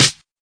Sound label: classic hh Csp Snr3, Classic Hip Hop; STYLE KITS (Hip Hop, Etc); Classic Hip Hop Mega Kit